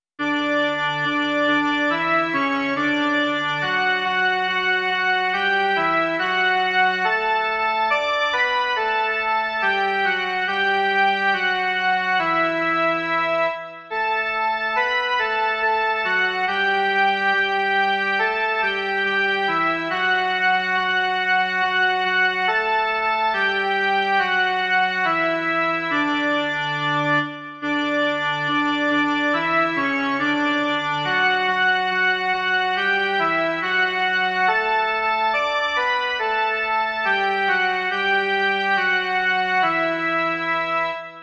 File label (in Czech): jen melodie; Ježíši krásný